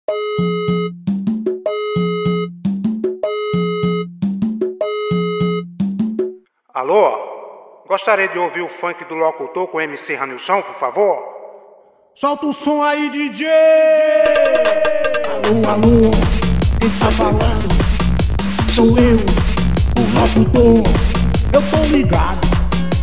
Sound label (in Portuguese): funk do locutor